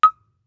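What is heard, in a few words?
Marimba deadstroke fortissimo Eb4; MIS Pitches - 2014; Percussion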